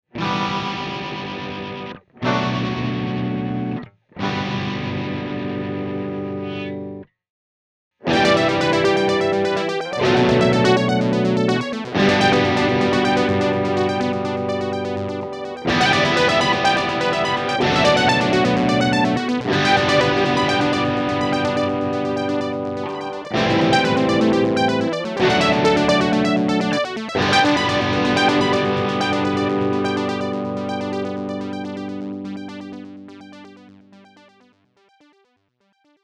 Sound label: Split Signal Arpeggiatior; Meris Enzo